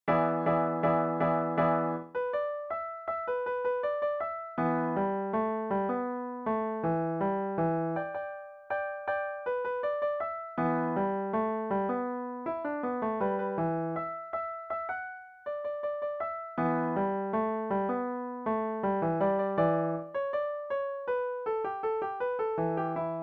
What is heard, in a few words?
Happy Solo, Harp